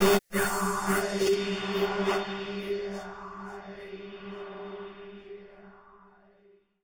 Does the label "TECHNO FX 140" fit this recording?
Yes